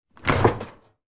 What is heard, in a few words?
household dishwasher door open